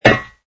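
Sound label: footstep steel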